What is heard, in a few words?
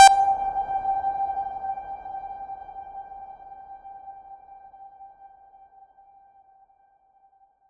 ping cinematic fear alert g